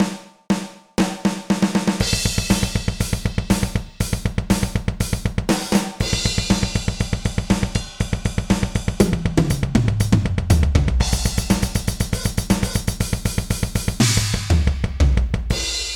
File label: drums